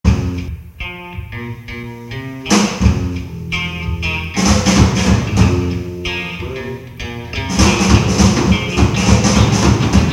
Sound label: Funk